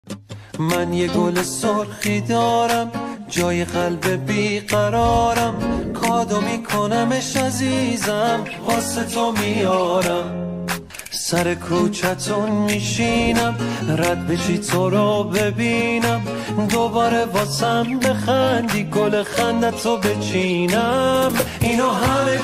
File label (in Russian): iranskaya muzika